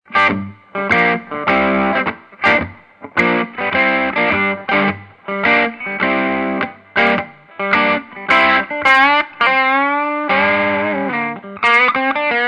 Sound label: hot slag overdriven